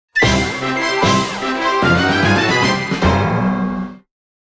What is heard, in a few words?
Whoops！（ゲームオーバー）; all target cluster